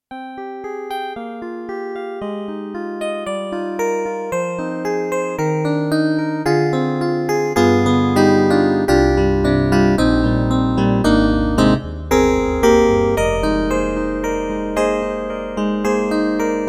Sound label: Yamaha MU90 Velocity Crossfade Electric Piano 2